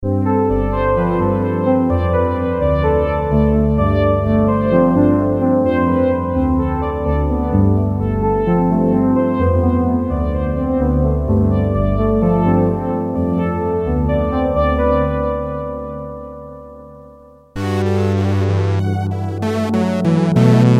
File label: Kawai K1 pad analog